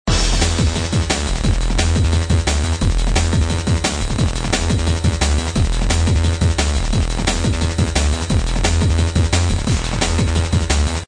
Loop; Chaos Faction

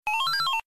Coin Sound Effect; ps4 xbox one 2016; Pac-Man Championship Edition 2; Arcade Game Series; Dig Dug

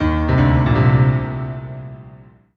MUSIC EFFECT Solo Piano Negative; startide macos switch windows gamerip 2017

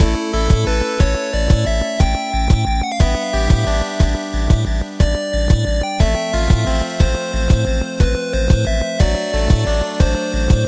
Overworld Loop; bat boy; ps4 ps5 switch windows xbox one xbox series xs

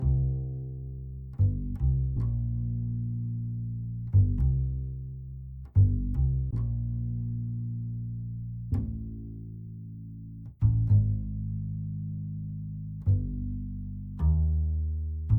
Bass; Toki Tori 2

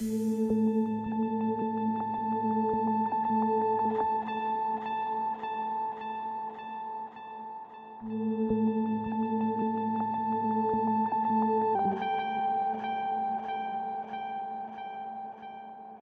Synth; Toki Tori 2

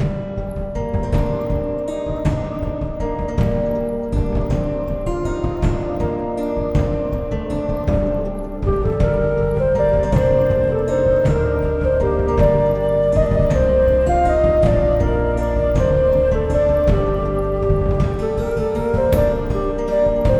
Medieval Theme Looped; Dual Blade: Battle of the Female Ninja

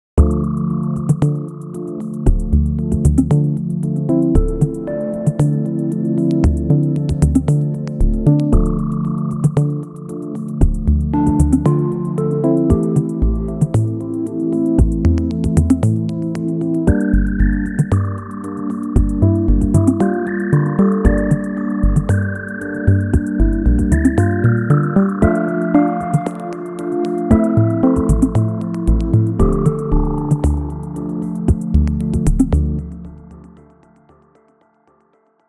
Medium Beats; Beyondium

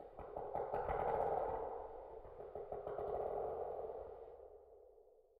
Mystic Forest Creaks; Max The Curse of Brotherhood; ps4; xbox 360

